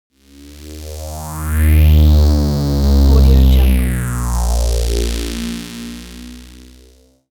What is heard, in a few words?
game fx approaching arcade car